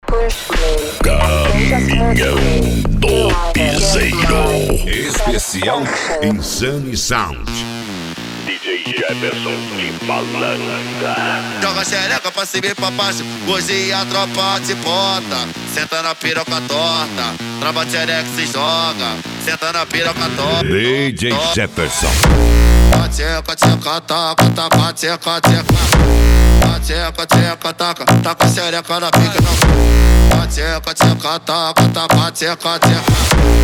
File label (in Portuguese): caminhao do piseiro especial insane sound